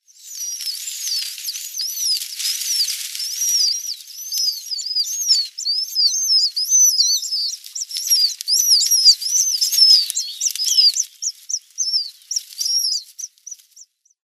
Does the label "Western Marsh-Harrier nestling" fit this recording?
Yes